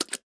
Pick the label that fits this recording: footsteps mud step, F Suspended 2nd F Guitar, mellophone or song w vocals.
footsteps mud step